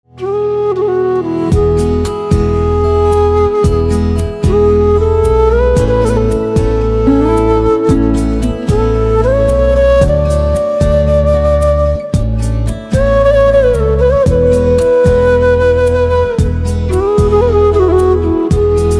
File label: Flute Instrumental Ringtone